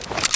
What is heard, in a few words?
silencer pickup; weapons foley; Spearhead